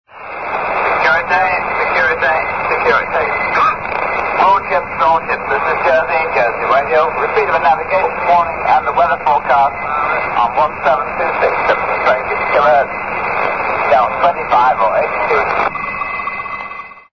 jersey radio usb; europe

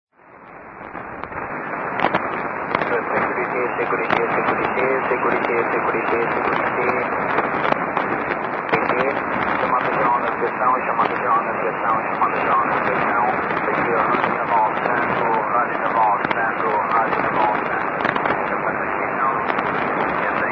usb; radnaval monsanto

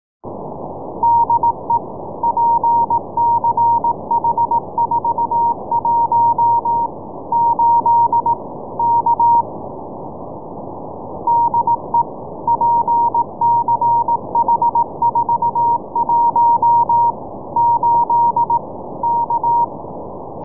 scheveningen radio; pch

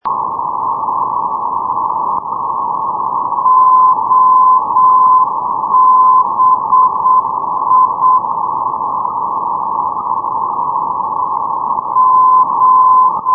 ndb oberpfaffenhofen obi 429.0; europe

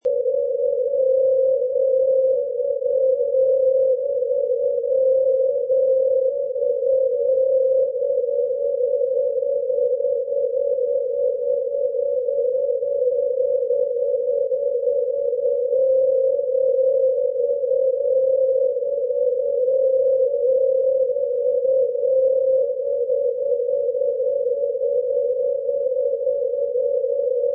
ndb levashovo; kiwi

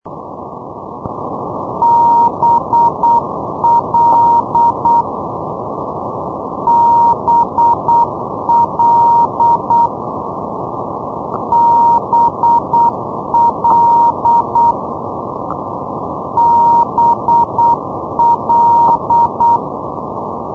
ndb blonduos

